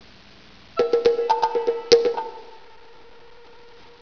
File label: rhythm